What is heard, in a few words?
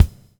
BUBL, Backbeat; Spectrasonics - Backbeat